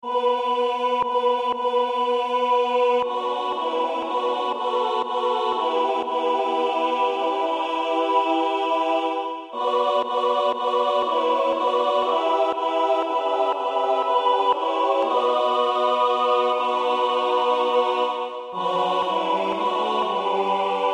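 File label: female; Vechere Tvoeya taynyya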